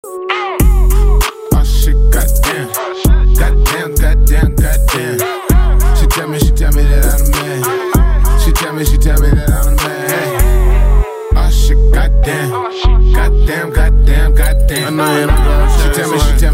bassboosted

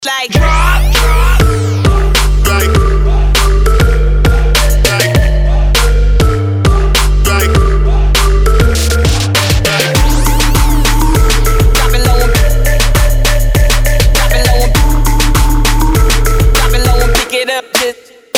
trap remix

